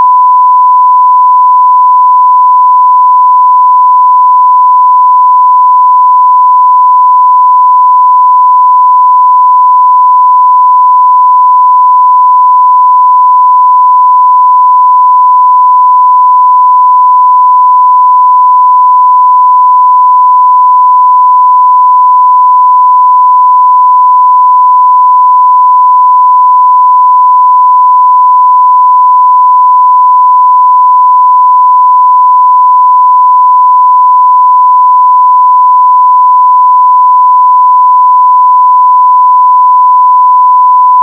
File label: sine 1khz; alsa